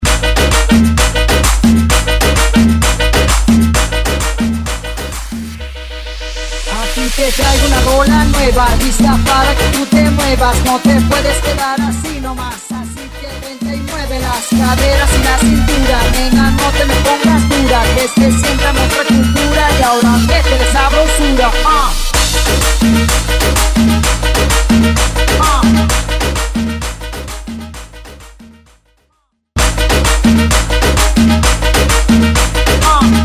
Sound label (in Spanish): Bota Y Tambor